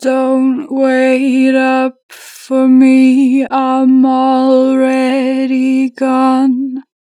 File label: Natural Vibrato, Vocals, Already gone, 4 bar vib; Harmor Vocals